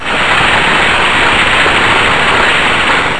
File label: rushing water; Sound Effects for Multimedia - Volume 1